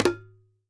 WORLD DRUMS MIXED DRUMS

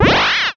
Blaster Fire, cartoon Foley Fx; musicradar; cartoon caper

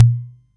Kick, Roland MC09

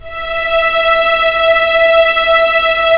STR, STRING; Roland - LA Composer vol. 1

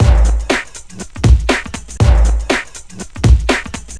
jump up Break, breakcore Breaks

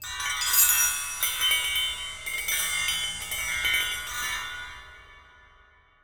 Chimes Bells; Percussion FX

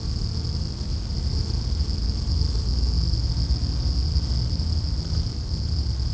Drone Ambient; Drums & Drones

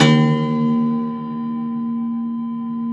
Rubbery Piano, prepared piano; Total Piano; Rubbery Piano 2 +ped

